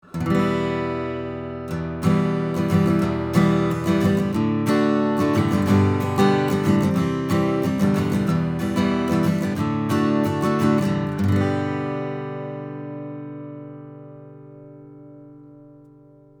KM 84 Acoustic Git 40cm 15 Fret A

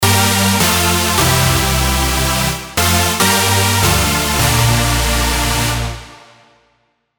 Nexus 3 Dubstep Electro Big Saw Chords

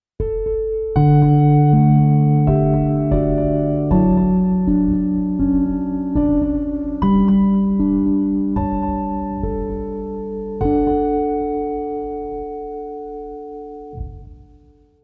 Arturia V Collection X CP-70; Lundi

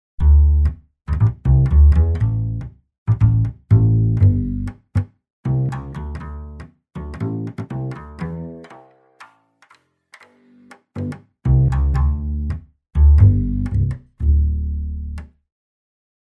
Softube Console 1 Fader Bass High Low Cut

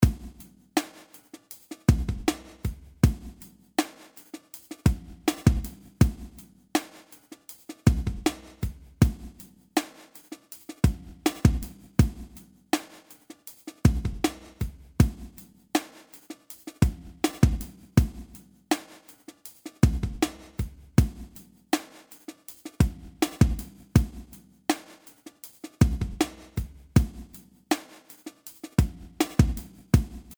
xform plus 49 percent plus 6 semi

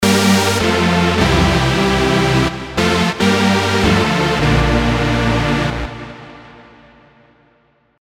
Classic JP Leader; Nexus 3

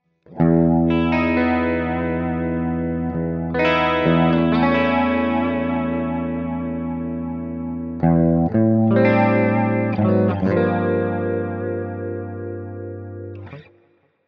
clean chorus; Ampero

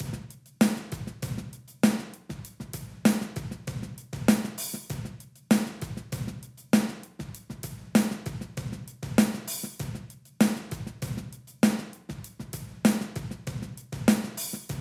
Drums Touch Sustain Kill; Flux Evo Channel

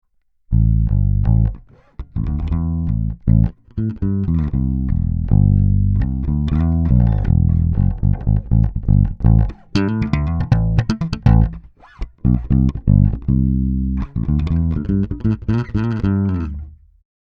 E-Bass dry; RND Portico

